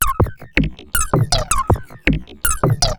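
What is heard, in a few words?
percussion, ambient, 160; eJay; Drum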